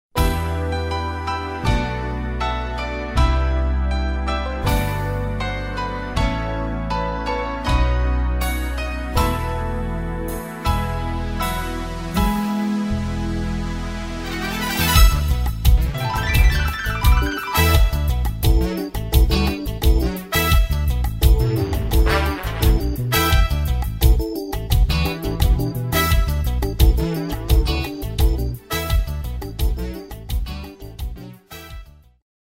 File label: E min, backing tracks